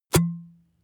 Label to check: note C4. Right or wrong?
Wrong